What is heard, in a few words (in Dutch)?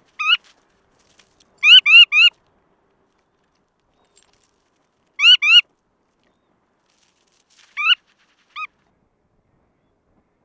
Kanoet Calidris canutus roselaari